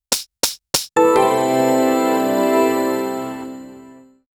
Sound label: generate program intro